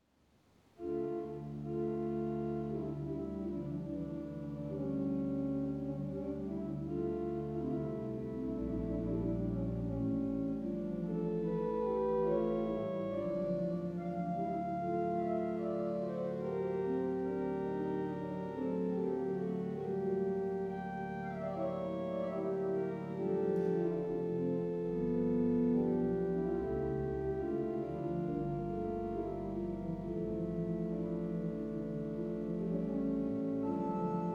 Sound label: open 3 nazard oboe kopfregal, organ; Nazard, Oboe ... Starts using the Great Open III & Pedal Dulciana 16. At 0:33 the solo is; phoenix